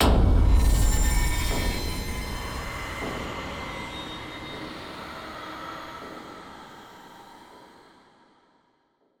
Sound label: Stingers sting pain huge